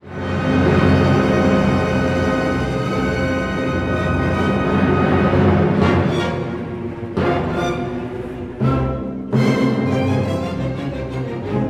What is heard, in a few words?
Orch